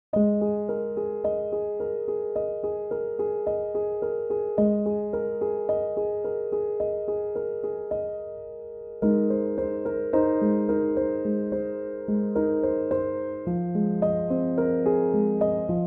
chill piano instrumental

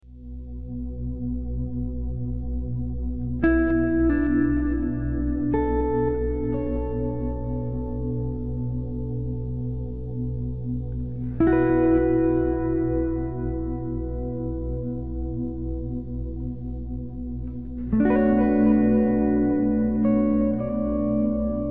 Soothing Music For Healing

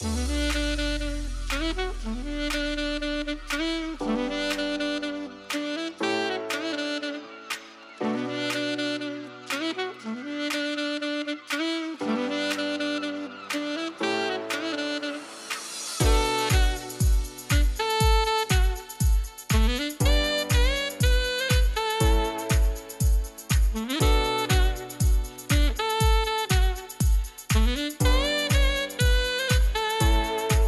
Saxophone, chill house lounge; Barcelo

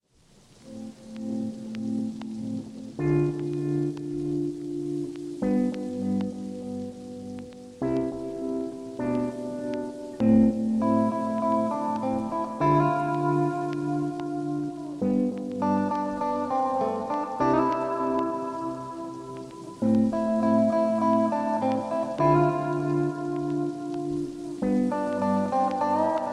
instrumental lofi

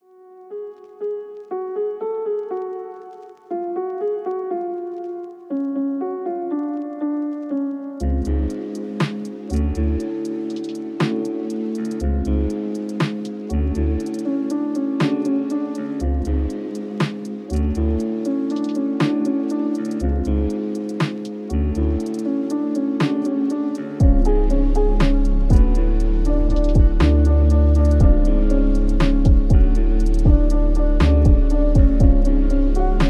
instrumental lofi

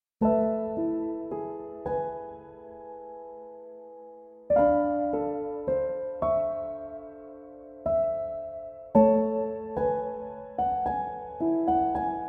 piano instrumental

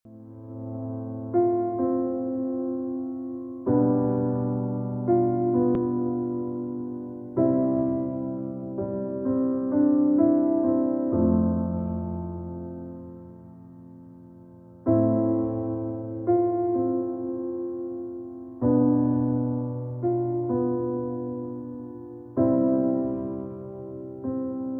Piano Relaxation

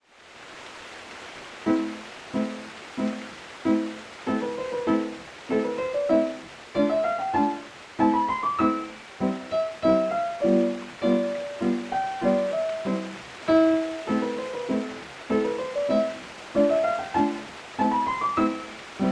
Classical Music Orchestra; Clasica; Friedrich Burgmüller; Etude Op. 100

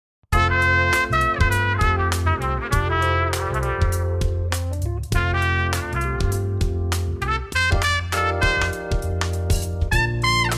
Swinging Lounge; Recepcion y Pasillos Hotusa 2024

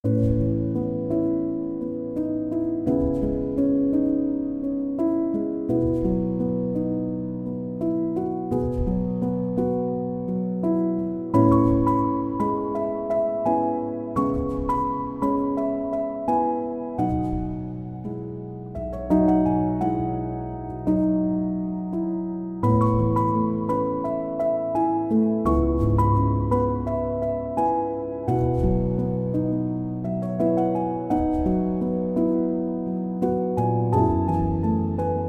Piano Instrumental

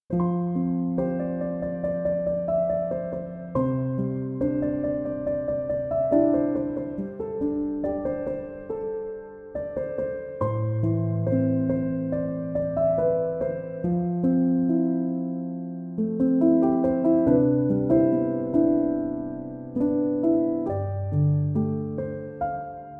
piano instrumental

